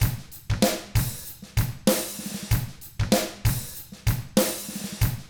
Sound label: HARD ROCK, Drums; ILIO; Double Platinum Drums 1